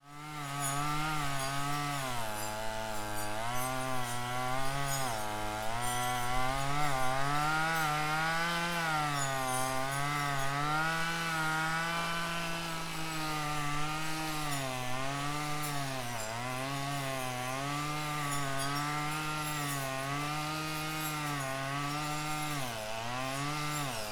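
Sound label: decont mowing, Fukushima University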